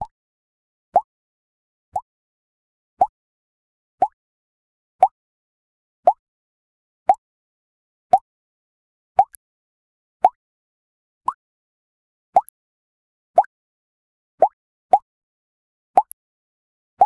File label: a orange drop into the, bubbles